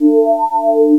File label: sine P5th osc; jazz jackrabbit 2; M8